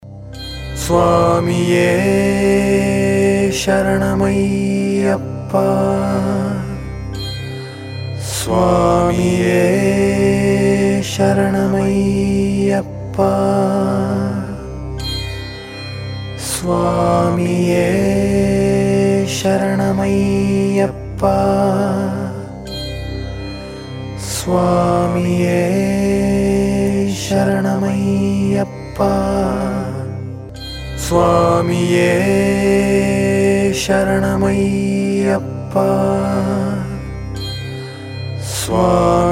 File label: Swamiye Saranam Chanting, Tamil Devotional; Ayyappan Tamil Devotional Songs